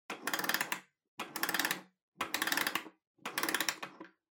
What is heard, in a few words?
Pendulum Clock Hardware Wind Up